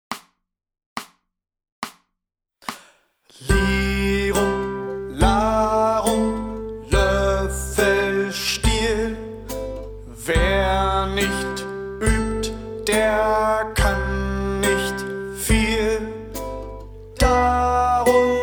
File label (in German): Lirum Larum Loeffelstiel langsam